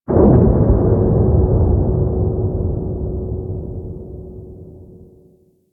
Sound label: Moon Texture Large, Ambience; Warframe; Orokin